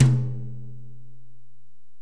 Korg x5, Drums

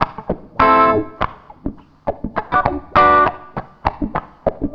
WAH, WAHWAHGTR

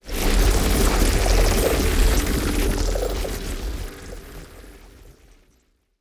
granulized, glitch

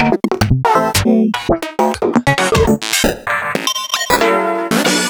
Synth Shots

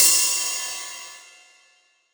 909 ride; Goldbaby Tape808 & Tape909; The Tape 909

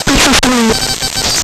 Distorted Reality; Spectrasonic; DIGITERROR

